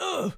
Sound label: grunt; running back